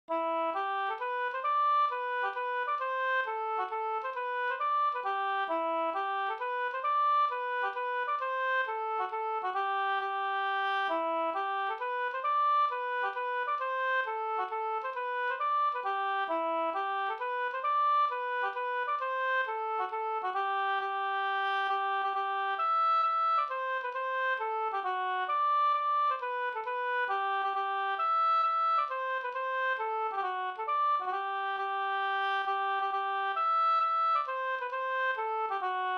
hambopolkett; e Rickard Forsman Sd

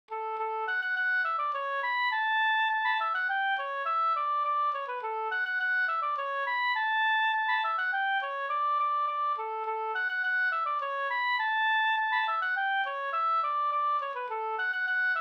skanklat Stekmarsch

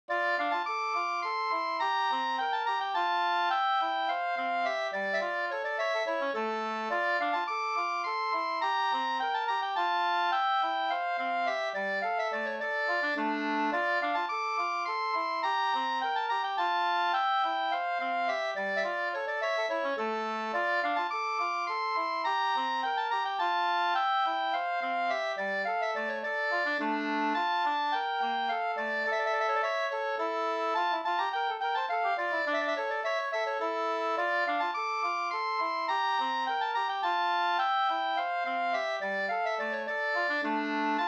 slängpolska

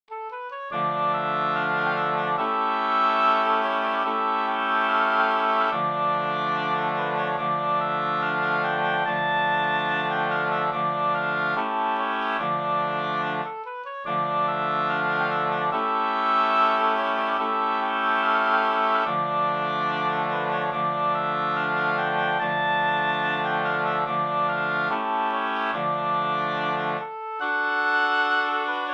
skotsk

